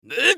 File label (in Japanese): 攻撃; グルトルデ